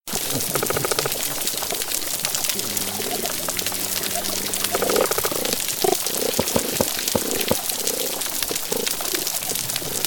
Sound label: sounds of coral reef animals